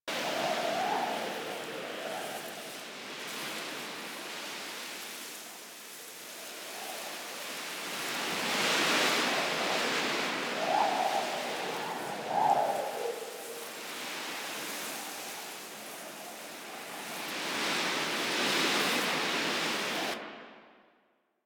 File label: wind grass medium loop; ambience; props; Eidolon; Warframe; Eidolon Plains